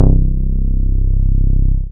BAS MINI, Mini-Moog

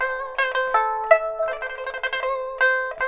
PIPA, 90; INIS - Opium

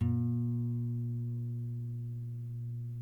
NYL NV C, nylon no vib guitars; Spectrasonics; Hans Zimmer Guitars VOL-1

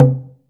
log drum mid; AKAI S-Series CD-ROM Sound Library VOL-5

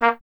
BRS TP A#0, Trumpet; Miroslav Vitous

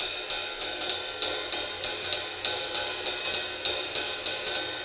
Ride 99 BPM; AMG; Keith LeBlanc Phat Butt Jams

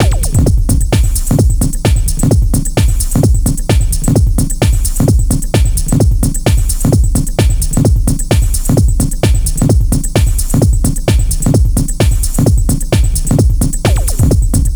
4 floor beat, trance

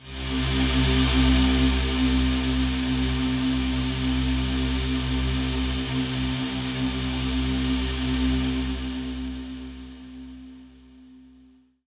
Pad C, Synth Prophet One Shots; Electronisounds

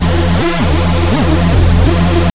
AMBIENT; AMG - Vince Clarke Lucky Bastard

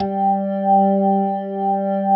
G3, PERCORG; Sonic Reality; Vintage Timetraveler

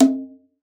Conga, Session Instruments

